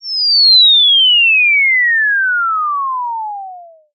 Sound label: cartoon falling whistle rapid descent; zapsplat